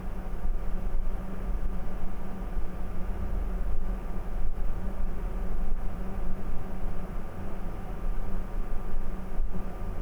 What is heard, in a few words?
Max RPM